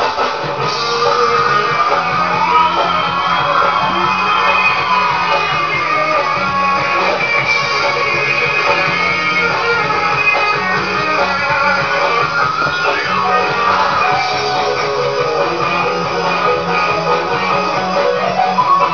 Jam

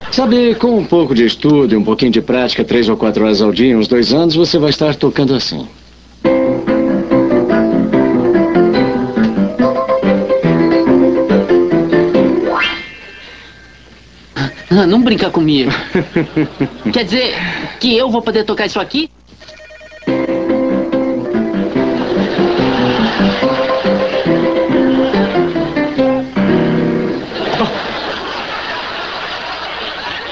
piano

